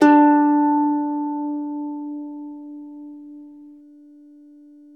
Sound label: CELTIC HA, Celtic Harp